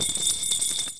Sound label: restore down; SANTASLIST; desktop themes; x-mas